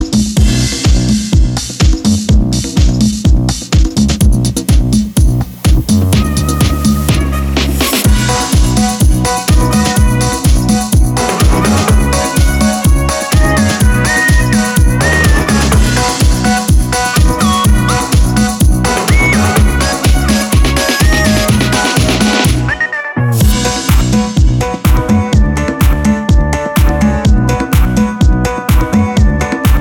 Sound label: Jump dub version